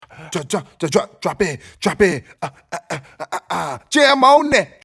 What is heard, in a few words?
break, vox